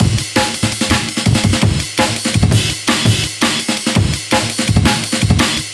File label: amen 167